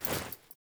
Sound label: Female Foley Action Default Jump, Default Clothing; Characters; Player